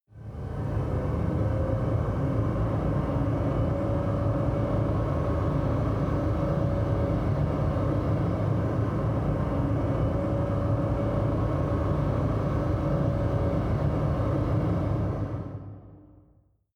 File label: machine room drone